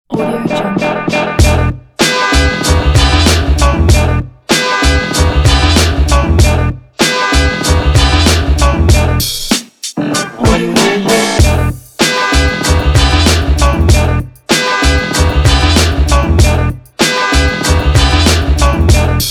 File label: hiphop